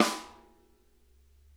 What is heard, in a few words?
rim mf R, snare